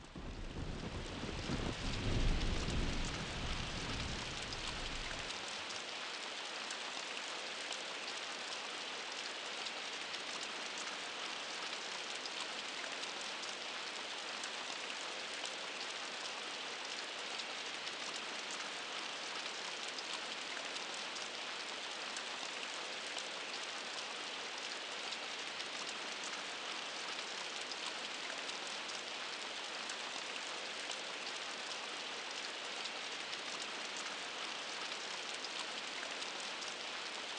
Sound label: thunder